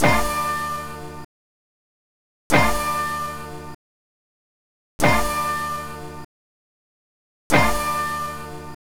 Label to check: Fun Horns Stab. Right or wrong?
Right